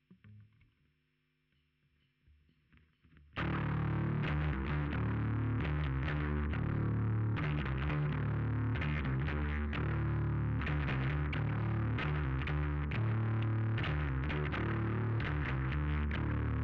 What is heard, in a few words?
Bass Sansamp